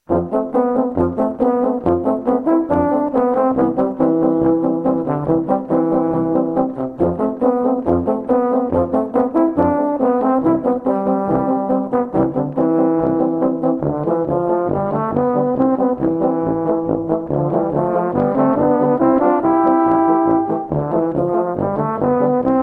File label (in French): baryton; instruments